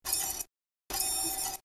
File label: Fast Whir Access Disk, computers